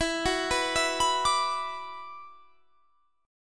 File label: E 7th Suspended 2nd, down; E7sus2; arpeggiated